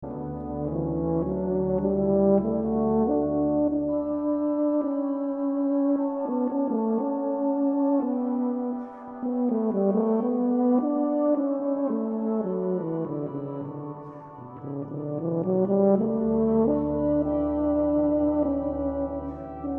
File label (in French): extrait basse